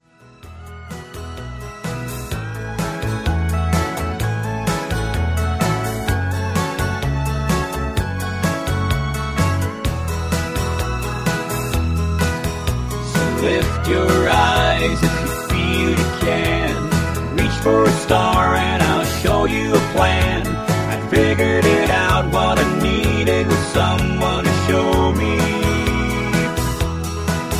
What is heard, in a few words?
music with harmony